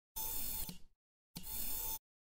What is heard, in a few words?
mechanism down up; oneplus